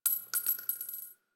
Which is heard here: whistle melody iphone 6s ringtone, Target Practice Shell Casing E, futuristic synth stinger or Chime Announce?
Target Practice Shell Casing E